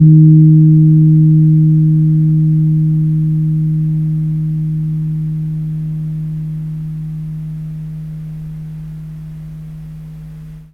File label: KEMPUR, KEMPUR GONG; Spectrasonics; Heart of Asia